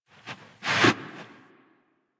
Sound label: Tame Idle Sit Sniff; Enemies; Warframe